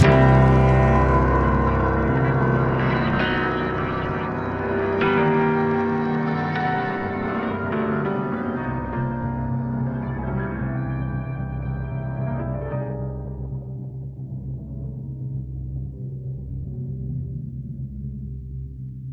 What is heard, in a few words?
opening chord